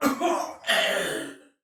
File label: Death, Soldier Male; Sinecure